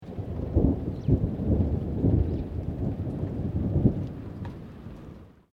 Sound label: THUNDER; Elemental weather effects; SoundEffects-Foundation4